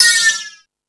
Hip Hop, Drum Machines

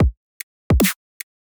intro drum loop; memories; M8; percussion and foley

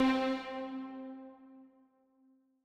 orc string C3, grime, 140bpm; Zero-G Sound Sense Grime Scene; Construction Kits; Its Primetime

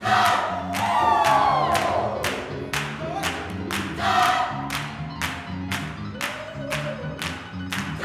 120 CIR JOY, Vocal Gospel CIRCLE LPS

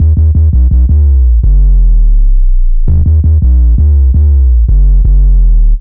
F2 165bpm, Drum & Bass, Bass Loops; Total Drum&Bass

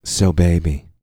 sultry, vocal, hip-hop; TB HIP-HOP

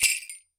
skranz, World Percussion; PS MIXED PERCUSSION; WORLD INSTR 2

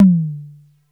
tom low; Crypton - DrumBase5000

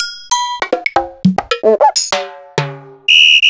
Yamaha RX-21L

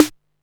snare, techno 138 BPM

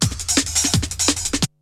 BREAKBEATS, TECHNO

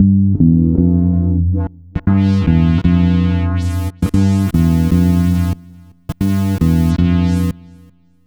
E-Bass + Analog Synth; Sonic Foundry (Sony Creative Software); Leo Cavallo Bass Taster

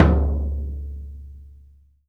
P.FTOM P, VELOCITY TOM; VELOCITY TOM 2

